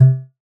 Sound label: String